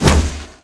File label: projectile, damage; player; physics